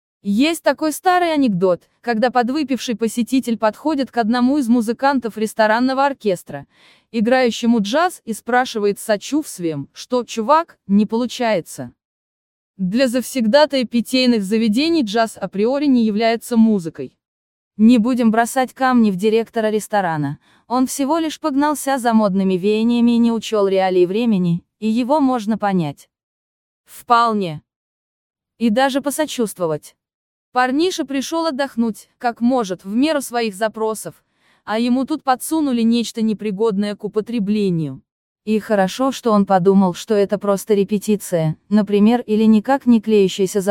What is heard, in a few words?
jazz